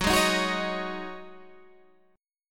C sharp Suspended 2nd Suspended 4th F sharp, chord